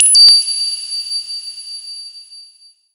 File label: SHORT, SHORTNOIS